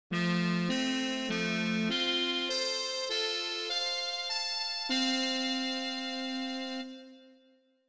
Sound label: muted trumpet